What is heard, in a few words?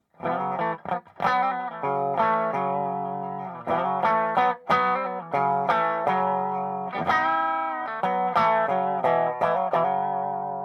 Weidmann, Distortion; Weidmann BEE-ODD; Thermionic